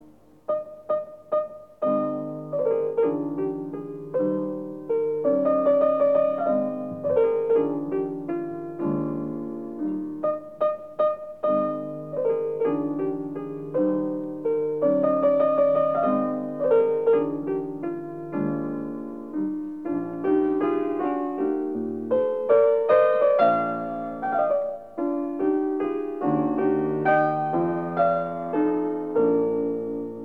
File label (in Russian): Концерт для фортепиано с оркестром Си-бемоль мажор; Вольфганг Амадей Моцарт